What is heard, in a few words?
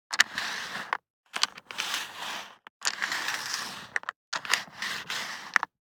DICE on Cardboard, Slide, Standard, 6 Six Dice; Samples; ArticulatedSounds; DICE Sfx Party (Extended)